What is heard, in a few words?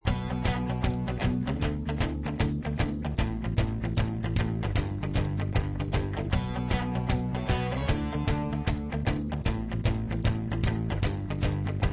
minigame loop; treasure hunt2